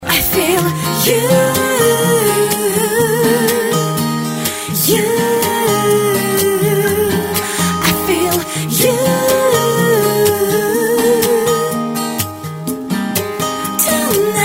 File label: acoustic remix